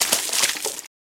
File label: Water Splash Foot Wa